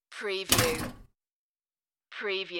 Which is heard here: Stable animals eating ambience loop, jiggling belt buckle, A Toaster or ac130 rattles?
A Toaster